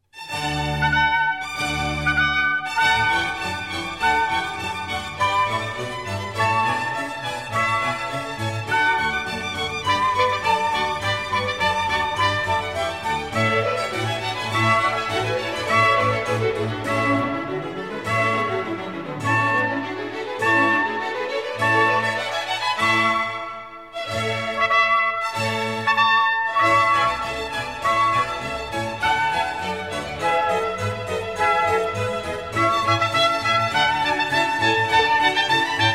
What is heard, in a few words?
Sinfonia, Trumpet; Alessandro Scarlatti; Sinfonia n.2